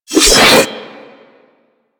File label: Lunge Swipe; Warframe; Stalker